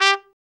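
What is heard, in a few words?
BRA TRUMP, trumpet, brass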